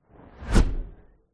fantasy magic spell fly fast past whoosh; zapsplat

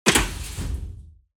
Just Impacts SIMPLE; soundbits

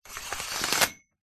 household curtains open

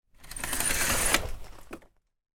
household blind vertical sliding open or close

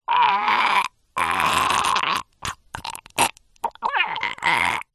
human male strangle choke die vocal; zapsplat

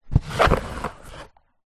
foley cardboard box small drag carpet

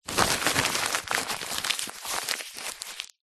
paper sheets handful scrunch up; zapsplat; foley

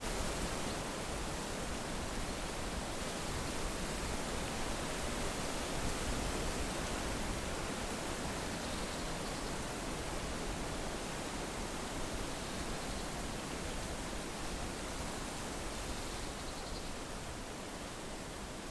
weather wind trees bushes countryside

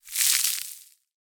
foley cigarette or cigar burn; zapsplat

